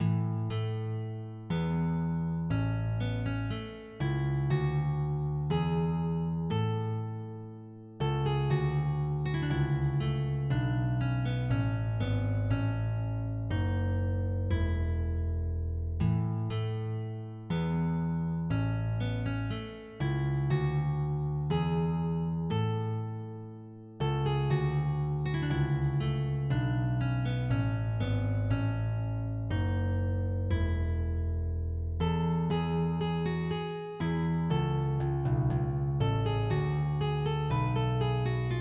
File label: Rigaudon, MIDI